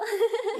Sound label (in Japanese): 笑